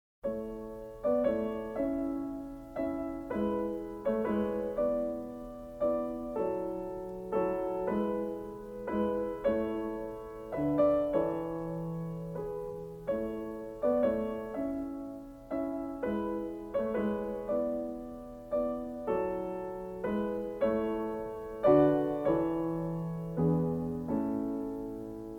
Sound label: Piano Sonata No. 11 in A Major, K. 331 - I. Theme